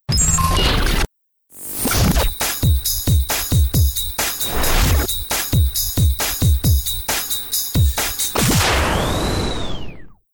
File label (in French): Base rythmé